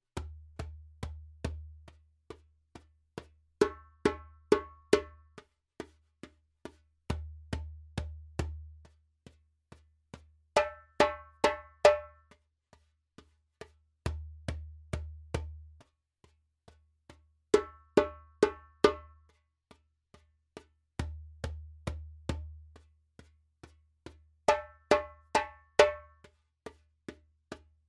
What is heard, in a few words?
djembe; djembebog oevelse